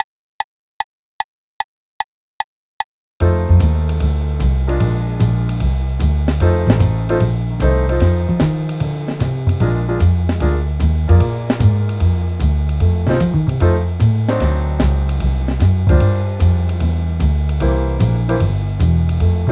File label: Blues, 150bpm